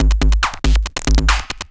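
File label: DRUMLOOP ELECTRO 140; Techno2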